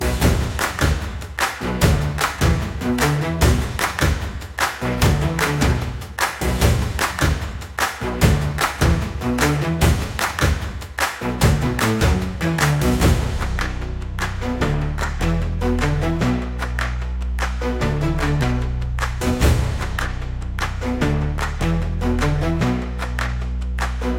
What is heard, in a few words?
Stomp